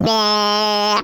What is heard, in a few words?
Classic Miss Sound; Jolly Battle